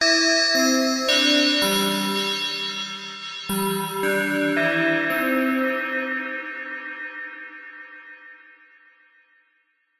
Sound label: musical big ben chime synthesized warped bells; zapsplat